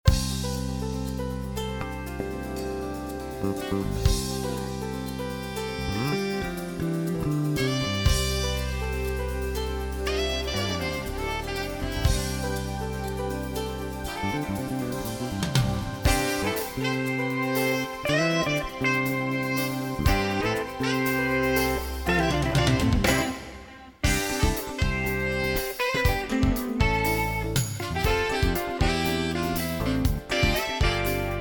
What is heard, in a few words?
bgm